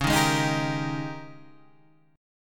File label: G sharp Major 7th Suspended 2nd Suspended 4th